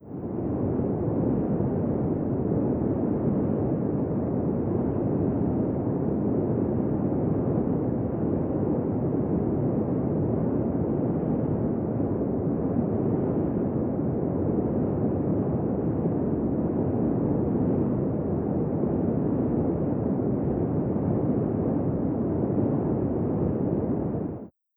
Hollywood Wind drone

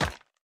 Weapon Furniture; Going Under

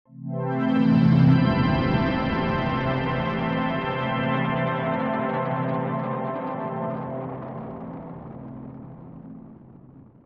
events sounds; 0°N 0°W

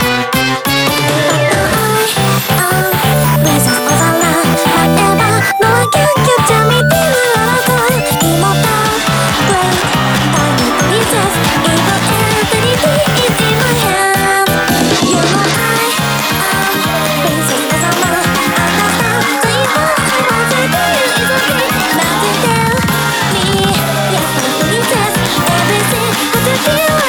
Bgm Reach

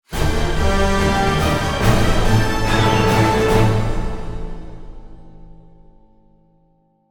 Transition

